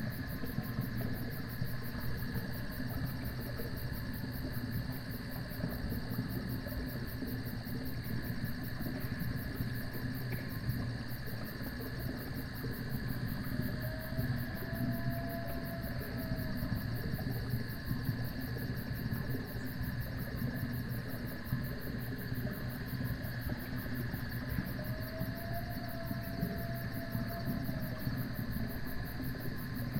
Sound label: ambiance moss; Fate The Cursed King